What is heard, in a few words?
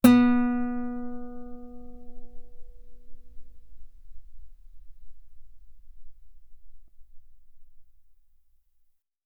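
Guitar ff B string B3; OmniPower4296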